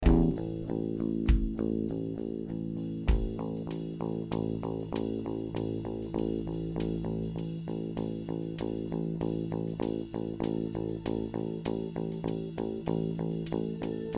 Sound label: Ominous Squiggling